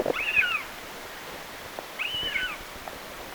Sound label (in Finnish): närhi matkii ehkä kanahaukkaa